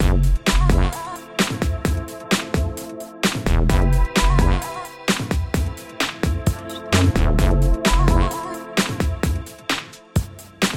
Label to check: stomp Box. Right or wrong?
Right